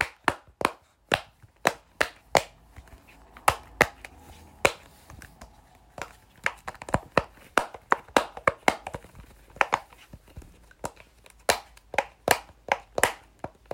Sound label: fidget dimple; Golden Crayon Sounds